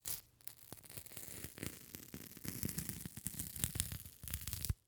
Bandage Wound; Medical; Blastwave

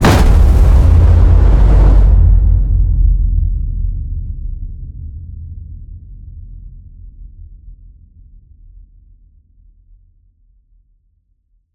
HIT Fire, Cinematic Hits Designed

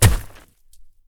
blast Rock Miss; Apotheorasis

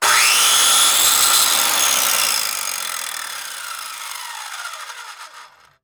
Circular Saw; Tools; 1001 Sound Effects

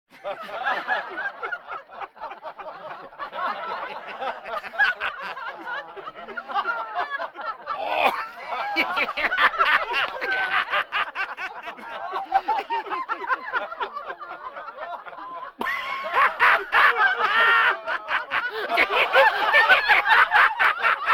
Vocal effects breathing Moaning Baby

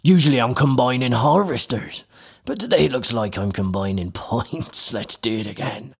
combining points; Pitch Black Audio Pong

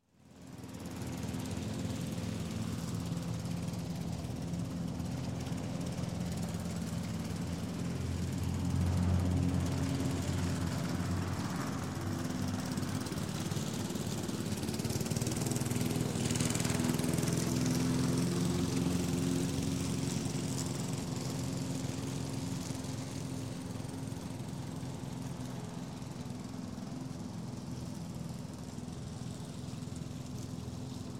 Lawn Mower Gas Cutting Lawn, Gardening; Sound Ideas; Series 2000

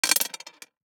DICE on Glass, Throw and Roll, Standard, Two Dice; Samples; ArticulatedSounds; DICE Sfx Party (Extended)

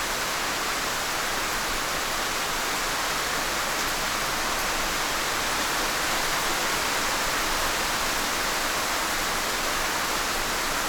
Rain downpour fluctuates; boom library; Thunder And Rain

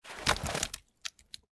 Snow Pile; Blind Quest - The Frost Demon

